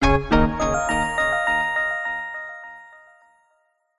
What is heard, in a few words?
game tone sudden event tone musical finish complete muted